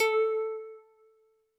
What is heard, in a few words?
Jazz Harp Kawaii A3; keys